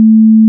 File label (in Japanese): 220Hz, 「ぷ〜ん」という音